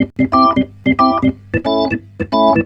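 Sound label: reggae organ C; Best Service Advanced Media Trax Modern Composer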